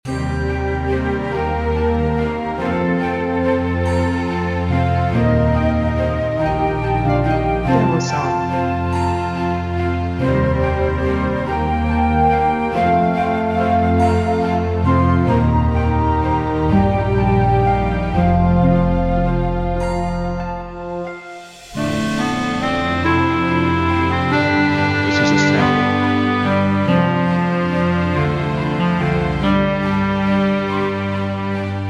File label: Dreamy Fairy Tale Loop Dynamic Tempo